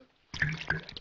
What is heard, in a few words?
drinking